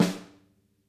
SNAR, Interactive Drum Kits

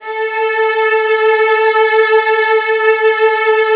A2, Strings; ILIO; Kirk Hunter Virtuoso Strings VOL-3; STR TAME

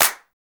SMOOTH CLAP; Drums In Your FACE!

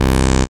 SYN TB303, Roland; Sound & Vision - Gigapack I CD 2

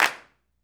clap, Roland MV8800; REALCLAPS

